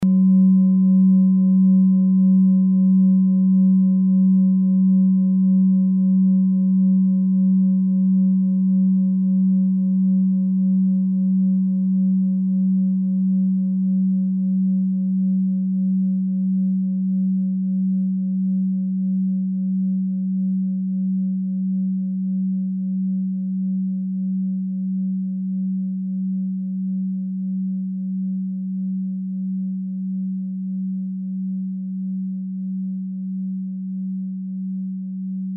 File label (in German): klangschale ladakh